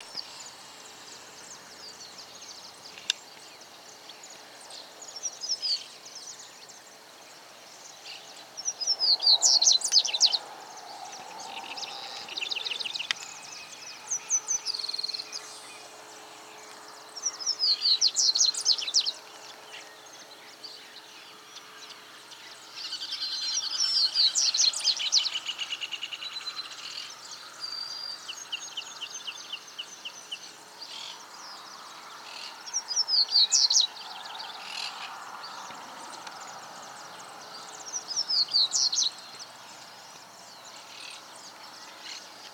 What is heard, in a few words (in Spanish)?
Voz corba; aves